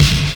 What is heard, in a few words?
Snares; Vengeance Samples